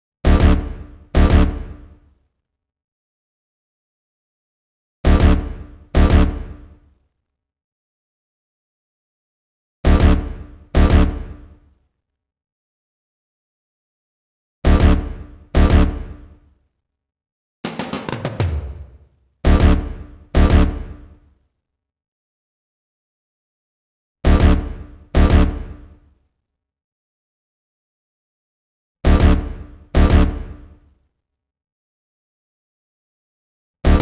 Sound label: Music Loop Stem